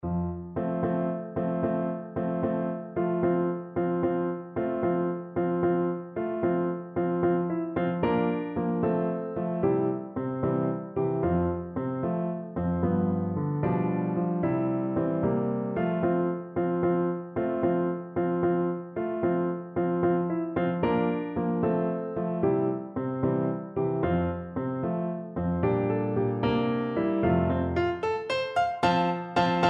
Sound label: Overture, Trumpet; Light Cav Overture Suppe